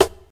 good snare sound D key, snare sample tuned to the D note. Loudest frequency: 1400Hz; snare drum samples